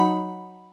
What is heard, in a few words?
Add Point